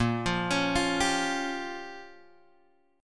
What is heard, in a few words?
Bb Minor 6th, Guitar, Open F; Bbm6 arpeggiated